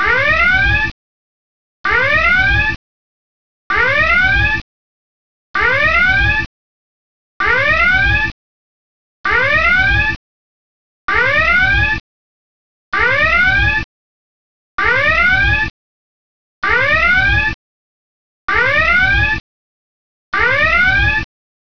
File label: red alert